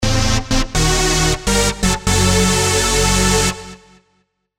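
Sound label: Sylenth1 old skool synth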